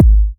Trance Kicks

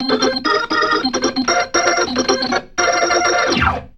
RIFF, ORGAN RIFFS; InVision Interactive; Keith Emerson

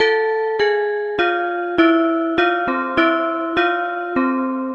Pelog Unison; Melodic; Ambient-Melodics-II

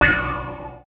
chords

